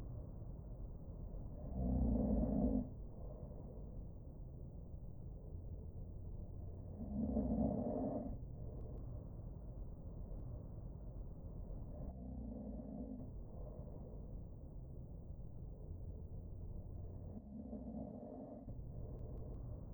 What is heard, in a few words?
soundproof effect snore; 《基準壁》からの音; ノイズクリアの防音性能; 《ノイズクリア設置後》 の音